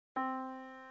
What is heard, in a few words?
yamaha Disk